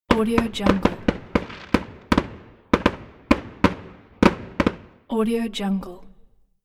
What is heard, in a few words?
fireworks